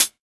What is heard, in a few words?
Dry Closed Hi Hat Sample C Key; Tuned drums